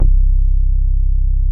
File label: WOOF BASS C1; SYNTHBASS